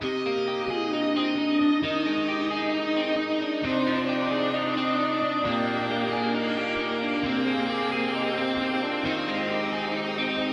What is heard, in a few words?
emotional electric guitar pop ensemble 133bpm C minor